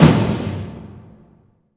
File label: toms, cinematic impact, drum